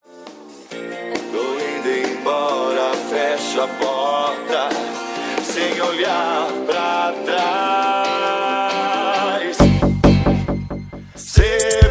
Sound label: mega funk